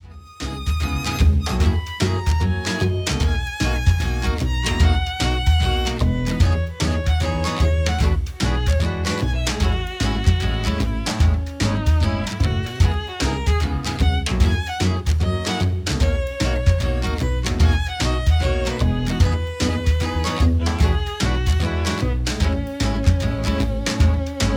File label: Song Loop; LocoLoco Song 4